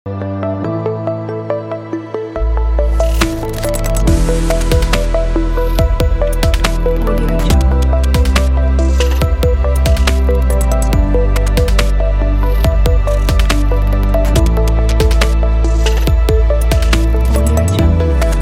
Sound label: opener music; technology opener adobe after effects slide